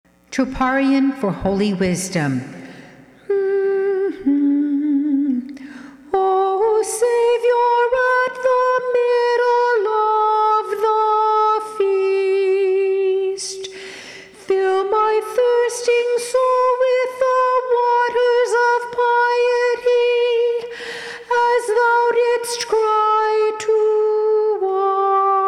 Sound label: soprano, choir; troparion mid pentecost